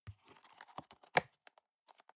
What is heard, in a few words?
plastic box food small close soft